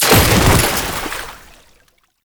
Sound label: Body Fall Water; Enemies; Warframe; Tau Dax